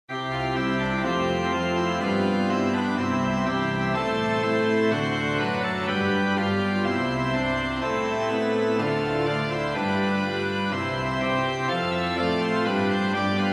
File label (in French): Ave Maria orgue